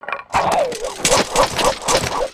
Basic Spinjitsu, creatures organic; Skullken; aronwk collection; ndaudio

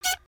Broom Bot step, creatures mechanical

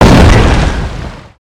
rock spawn; creatures organic

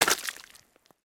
Foot Mud Walk Light; footsteps

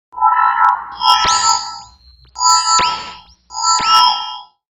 Robot Idle Scan; Lego; aronwk collection; ndaudio; creatures mechanical

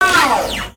Spider Boss Fidget; creatures mechanical

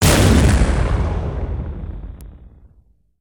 Spider Boss Fire Rain Impact; creatures mechanical

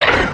hit, creatures organic

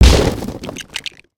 Present Smash; destructibles

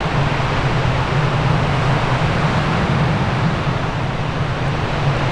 Spider Cave Heavy Wind; ambience avant gardens

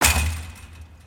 Hit Chain Fence; creatures mechanical

